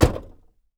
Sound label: SMALL DOOr